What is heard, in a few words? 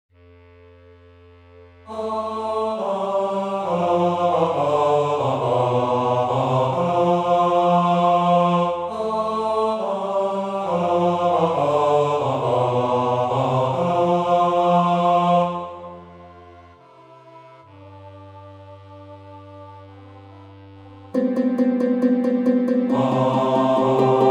Men